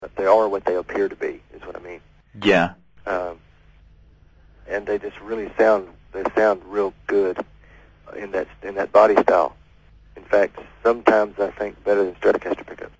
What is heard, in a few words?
lipstick, Strat; partscaster; lipstick pickups; guitars